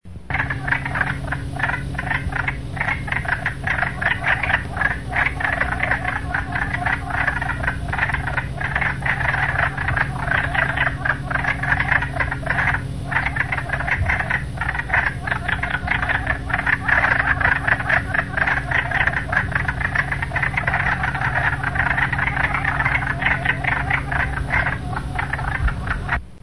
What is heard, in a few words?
Wood Frog; nature north